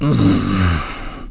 Grumble; Renado grumbling at Barnes

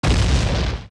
Boulder Fall; zelda; OOT

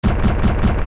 Forest Temple Puzzle Pieces Fall

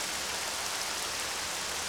Rain; OOT

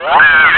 Burn; getting burned; Link

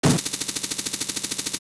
Hookshot Fire; Firing the Hookshot

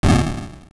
Bomb Blow; Bomb exploding

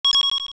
Rupee; Getting a rupee

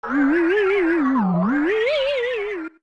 Transform Off; Transforming with a mask

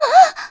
Gasp; Zelda gasping (seeing Ravio unmask)

